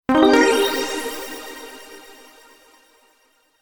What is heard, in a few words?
Power Up